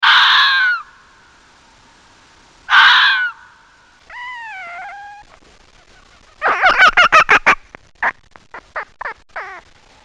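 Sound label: fox; lisa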